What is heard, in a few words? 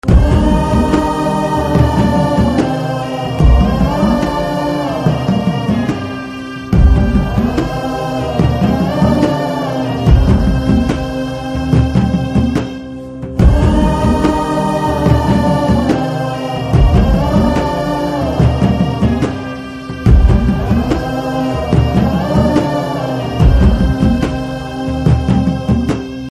Movie BGM Telugu Ringtone; YSR Biopic Yatra